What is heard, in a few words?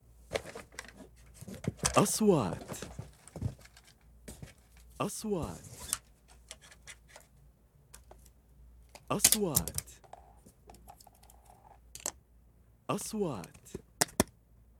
bag equipments equipment bag camera shooting stuff